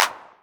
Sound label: Clap, Novation Drumstation; DrumstationV1